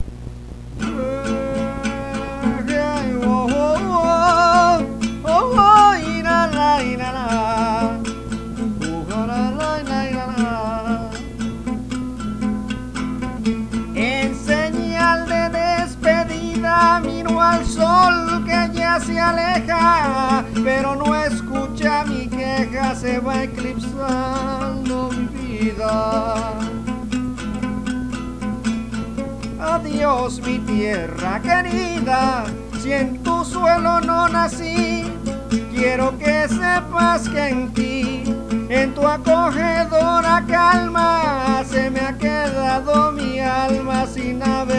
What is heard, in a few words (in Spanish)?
Llanto